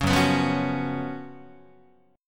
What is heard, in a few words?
Gb Major 7th Suspended 4th Gb Guitar